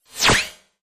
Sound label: Sharp Cut Sweep